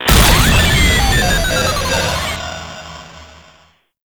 Hack Minigame Fail; Entrati

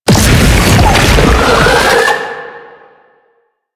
Octopede Unearth Dig B, Sounds Enemies; Warframe; Man In The Wall

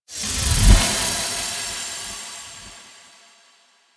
Energy Thread Disappear; Warframe; Apostasy

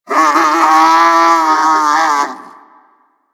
Vulpaphyla Player Lure Call; Deimos; Animal Lure

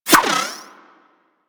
Pet Skip Rope Whoosh; Warframe; Moa; Tenacious

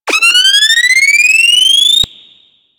Shock Trap Projectile; Missions; Arena; Grineer Champions; Charger

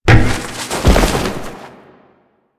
APC Dismount Foley; Enemies; Lasrian; Warframe

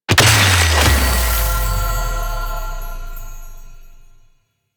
Mining Laser Rock Breach Fanfare; Weapons; Warframe; Eidolon Mining Lasers

